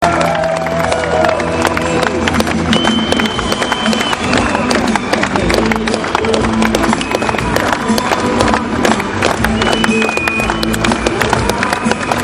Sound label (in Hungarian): bevezeto taps